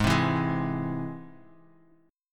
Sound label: Ab Augmented Ab; Ab+ strummed; JGuitar